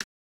click, interface sounds; p0ss

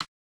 click, interface sounds; p0ss

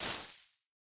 Buy sell, retro rpg menu sounds; leohpaz

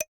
click, interface sounds; p0ss

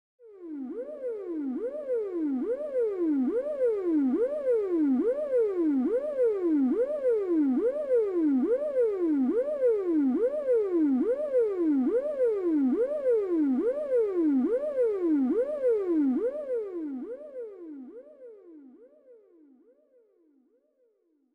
siren, Scifi; OwlishMedia; SoundEffectPack

